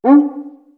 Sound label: QUICCA BOTOM; East West; Global Sounds